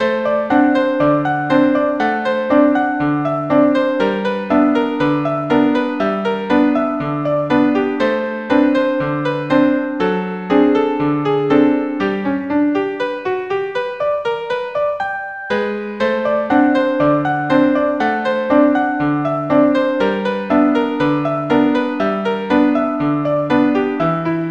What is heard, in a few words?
The Black and White Rag, 120 bpm